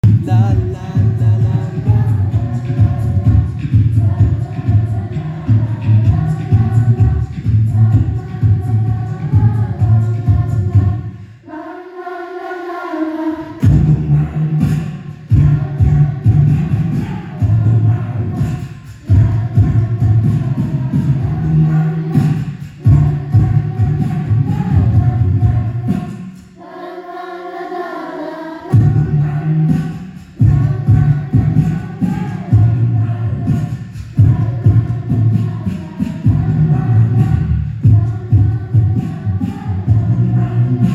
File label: Beatbox